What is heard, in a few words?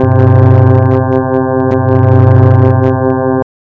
Elevator Move; Cruelty Squad